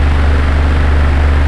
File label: fan